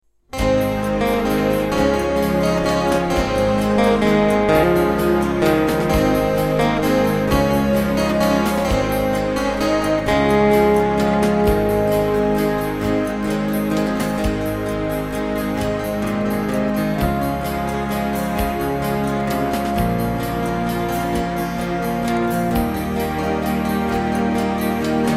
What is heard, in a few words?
G# Backing